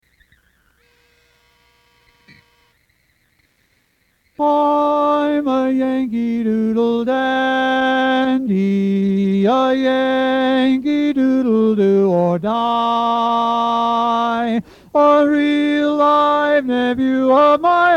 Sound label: Yankee Lead